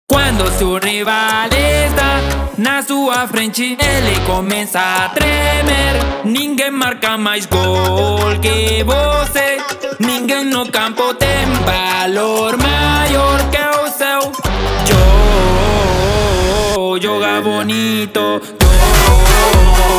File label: phonk